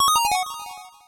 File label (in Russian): perelivchatij melodichnij zvon